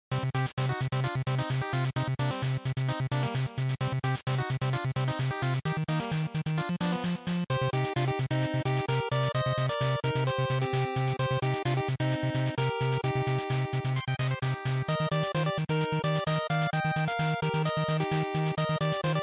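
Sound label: Game Boy Action Stage, bgm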